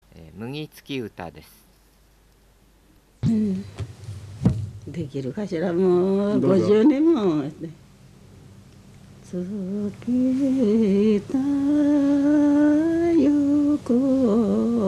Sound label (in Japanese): mugitukiuta, minyou